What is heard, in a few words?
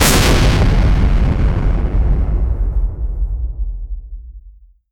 a holy energy arrow fires